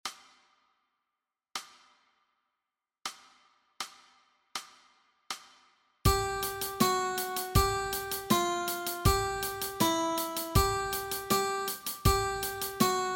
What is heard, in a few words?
Guitar Exercise